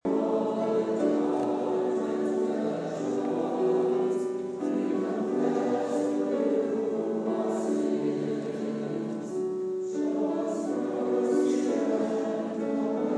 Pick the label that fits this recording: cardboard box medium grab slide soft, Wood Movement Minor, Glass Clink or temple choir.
temple choir